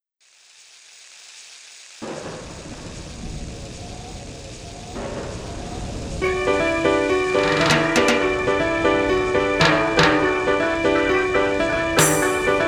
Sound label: karaoke